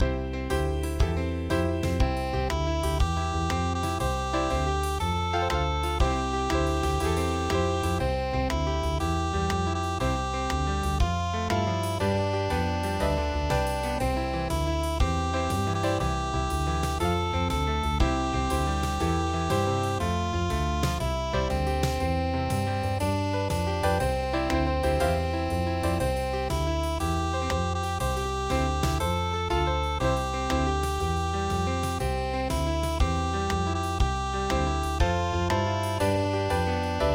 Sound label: harmonica blues; midi; traditional